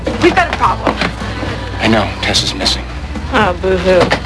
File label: boohoo